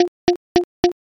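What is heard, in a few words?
footsteps flooring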